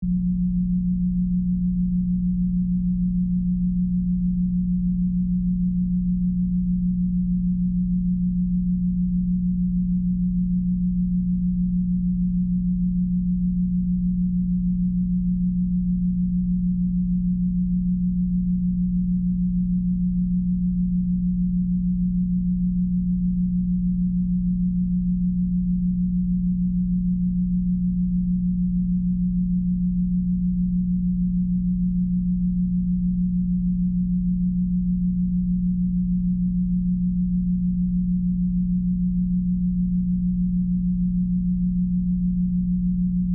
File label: Drone Energy Source, Scifi, Drone, Energy, Source, Flutter, Science, Fiction